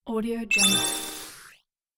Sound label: notification start